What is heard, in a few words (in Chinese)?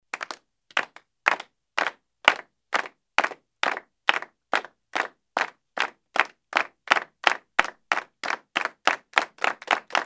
整齐的鼓掌